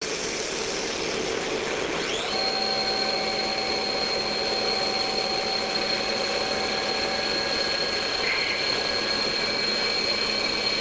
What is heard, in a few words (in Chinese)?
L/D＝20的无阶式加工, 切削条件 钻头 ：5mm 切削速度 ：78.5m/min (5,000min -1 ) 进给 ：0.12mm/rev; (600mm/min) 孔深 ：105mm 被切削材 ：S50C 切削油 ：半干式（3cc/h）; 株式会社不二越; 切削工具